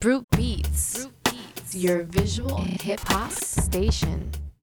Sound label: Sweeper; brutebeats